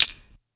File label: Clap Snap Clicks; Lost Tapes From Mars; Clap & Rim